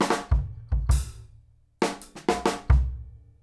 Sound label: FILL